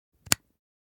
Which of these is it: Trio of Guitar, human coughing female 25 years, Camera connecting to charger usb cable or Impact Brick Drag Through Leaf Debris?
Camera connecting to charger usb cable